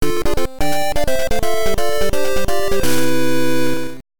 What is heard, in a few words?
Game Over; pinball; HAL Sound Team